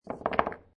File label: Billiard Pool Snooker Put Several Balls On Table; Pool (Sound Effects:Sports)